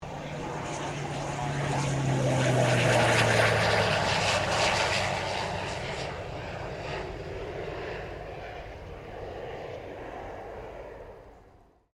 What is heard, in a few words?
1985 PC-9A Land, PC-9 Military Trainer; Airplanes; Vehicles